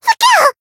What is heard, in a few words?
damaged, jp; nekoko